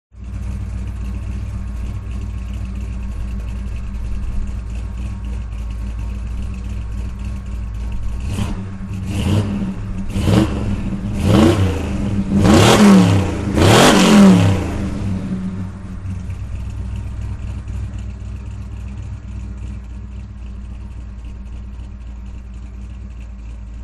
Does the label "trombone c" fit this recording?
No